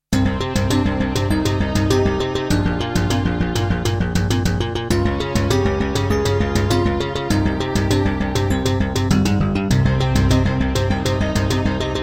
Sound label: Introduction, Midiplus MiniEngine USB; Hocus Pocus